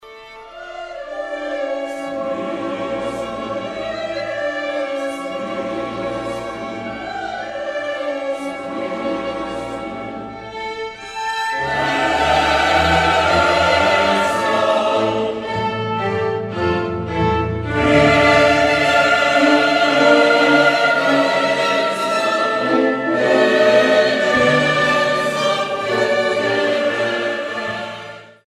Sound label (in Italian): Kyrie in Re min.